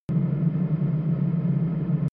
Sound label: drone hollow wind